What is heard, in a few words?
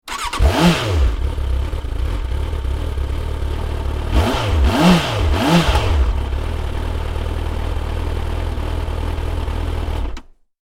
transportation motorcycle start rev off exhaust pipe perspective suzuki gsx650f; Motorcycle Start , Rev Then Engine Off - Exhaust Pipe Perspective - Suzuki Gsx650f; Vehicles; Motorcycle Start, Rev Then Switch Off; McKinney Sound